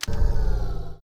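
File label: button off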